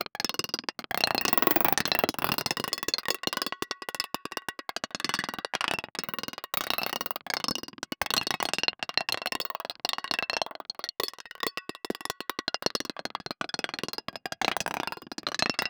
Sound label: percussive metallic click; build up